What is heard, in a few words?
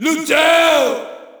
Shout, audience; UFC Tapout 2